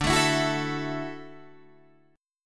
D-Major Add 9th D down Guitar Open D

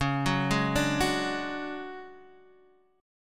D Major 7th Flat 5th Db, chord

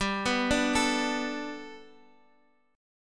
G Major Add 9th; 12,11,10,12; down; 4G,5C,5E,5A

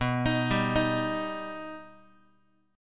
B Augmented B down Guitar; arpeggiated; JGuitar

